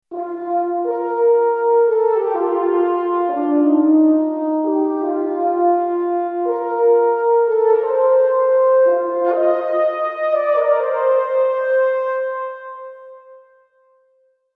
pure space french horn

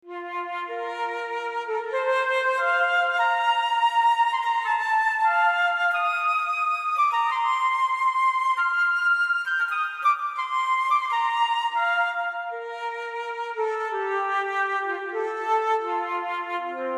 pure space flute

